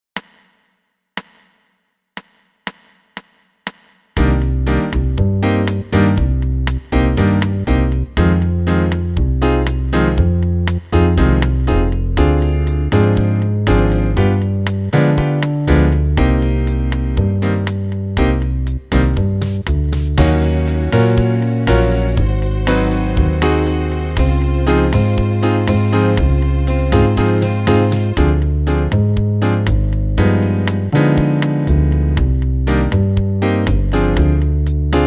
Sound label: Jazz